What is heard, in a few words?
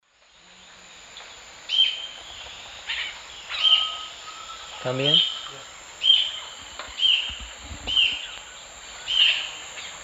Galbalcyrhynchus leucotis, calls and song; S. America; Eastern Lowlands